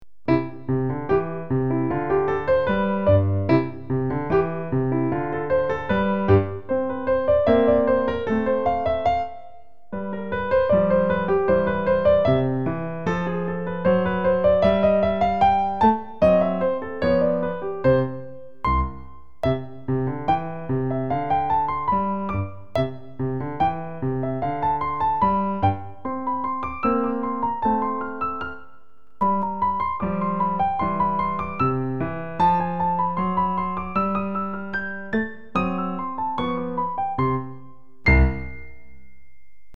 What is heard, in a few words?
Jazz Menuett